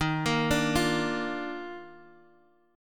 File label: Eb Major 7th Suspended 2nd Eb, Guitar, Half Step Down; EbM7sus2